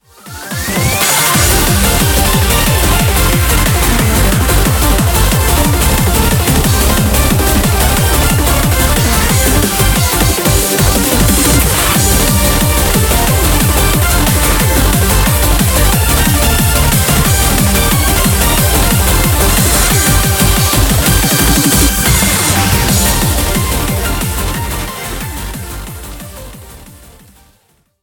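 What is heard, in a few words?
background music crossfade; Invaxion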